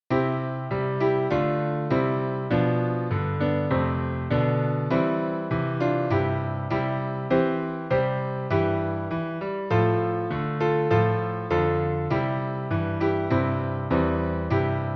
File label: Piano